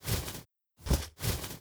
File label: Majestic Lion Action; Seer; GamigoNA